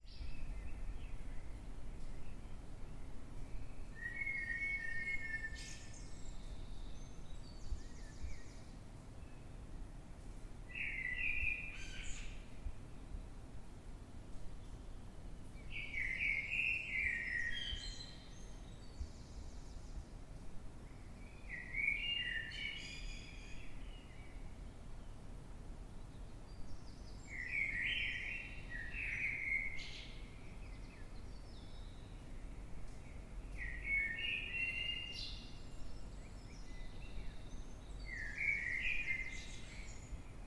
Blackbird Dead Of Night, blackbird singing in the dead of night london uk; 3:50am